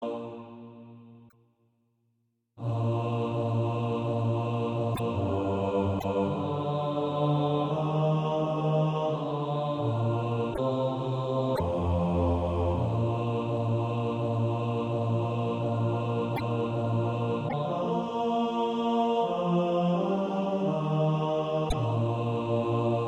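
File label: bass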